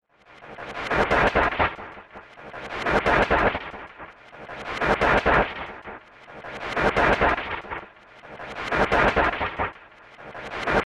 Whoosh Delay Hits Dark Wah; Blastwave; Whoosh Sound Effects

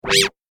Screen Zap SciFi Computer Screen Zap Off; Computer Screen Zap Off Scifi Technology Static Blast; Noise; Electronics

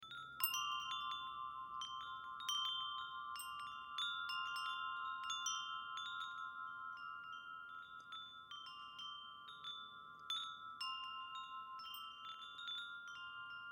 Wind Chimes, Wind Chimes Ambience Musical; Multimedia; Foley; Blastwave

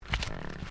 Footsteps Ceramic Tiling Step; Crocs; Footstep Foley; Footsteps (Sound Effects:Human)

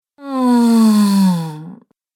Woman Sensual Moan; Sex Sound Effects; human sound effects

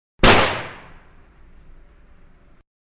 Guns-4 Inch 38 Special-One Shot; Pistol; Manhattan Production Music

